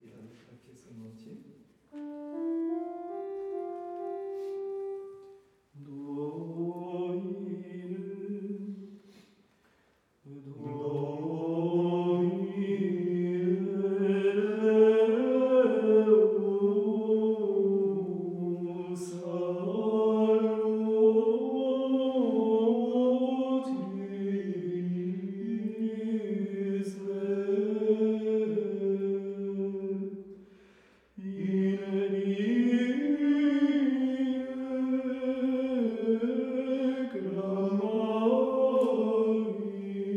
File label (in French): gregoriens